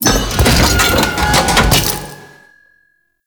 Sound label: Thumper Part Pickup; Grn Forest; Veilbreaker; Grineer Forest Thumper; Kahl